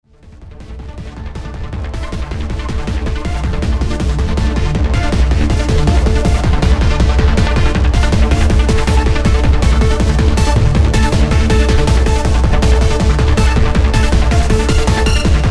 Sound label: Pulse; KAsmonaut; Deutsch Machines; patrick r park